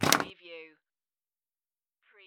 Pinball sound, rattle and clank

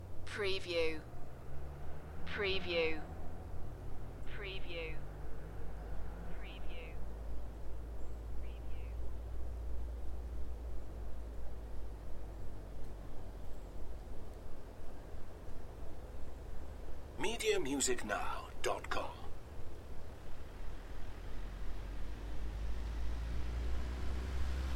Welsh Forest ambiance distant cars and drive by; beanstalk audio

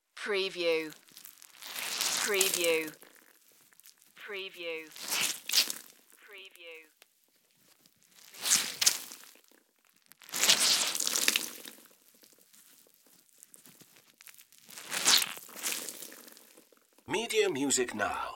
wring out cloth

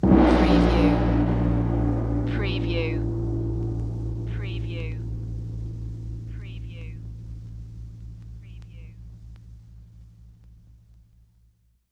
Gong Chinese Single

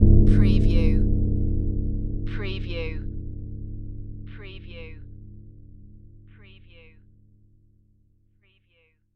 Hit Metal

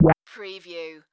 Multimedia Button; sound-effects-bible

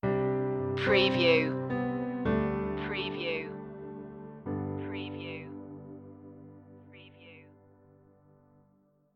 reach for your goal stinger

